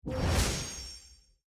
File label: UI Open Treasure